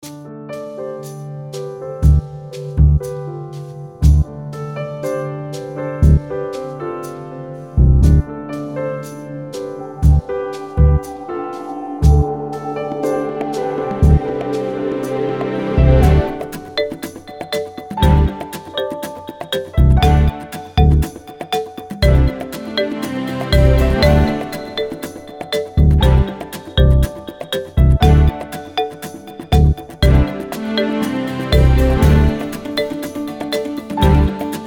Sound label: Under Dialouge